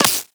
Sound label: Laser Impact Flesh; Warframe